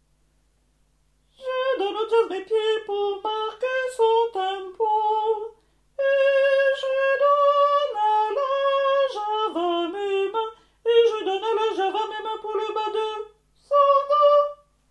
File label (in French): soprani coda